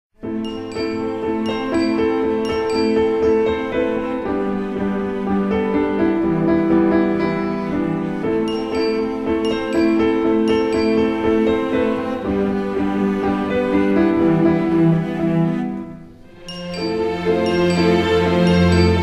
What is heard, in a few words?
Cello